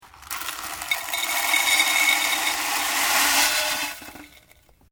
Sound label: GLASS BOWL FILLING DRY FOOD STUFF, Kitchen glasswear; Glass (Sound Effects:Materials)